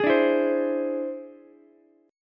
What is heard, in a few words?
E Augmented 7th E down Ukulele